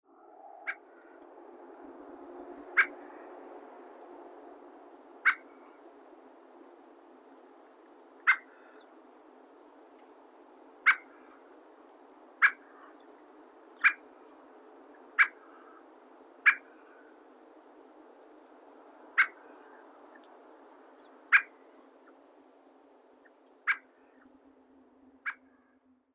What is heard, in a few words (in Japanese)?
tsugumi, chichibu